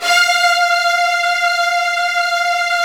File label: Virtuoso Strings